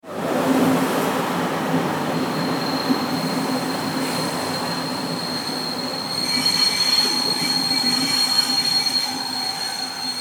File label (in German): zug bremst